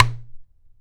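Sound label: IPU GOURD, HAWAII PERC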